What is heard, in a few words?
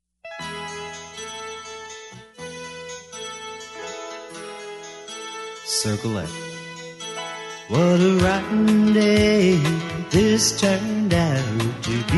vocal; square dance music